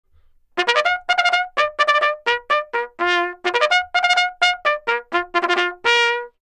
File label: Bugle Plays First Cal